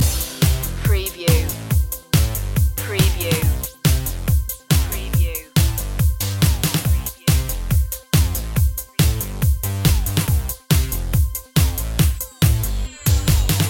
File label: singing robots loop